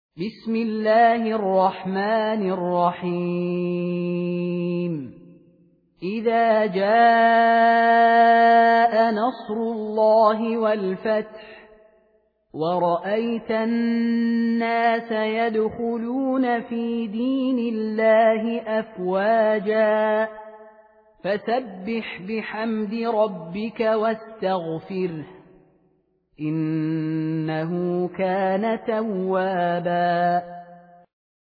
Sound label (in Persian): سوره نصر; خالد المهنا